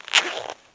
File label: zip Sound